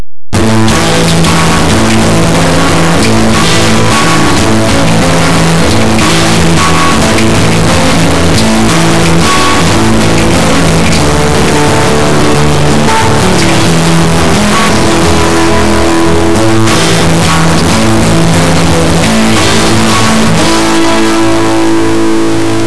musica newage

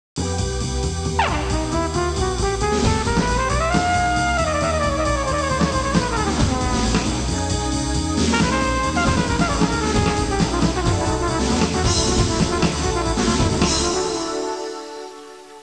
suonerie musica jazz

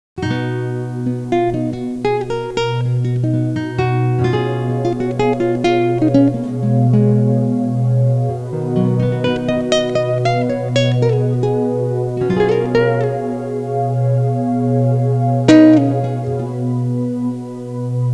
suonerie musica jazz

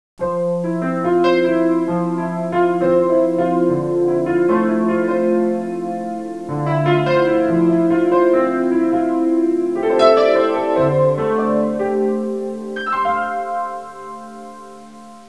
audio suonerie musica mistica

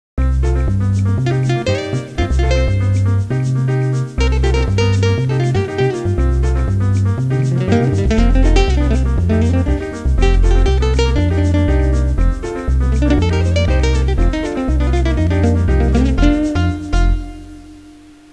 suonerie musica jazz

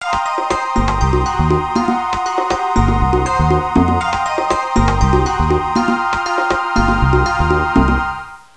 musica traditional est; fareast

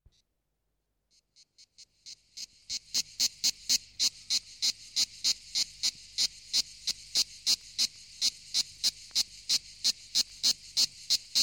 insetti insect; animali

